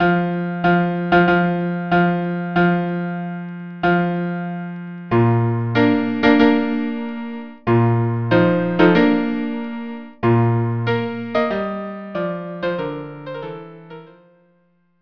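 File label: violin cello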